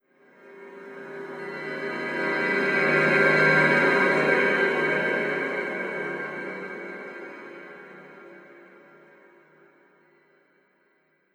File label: Intro Swell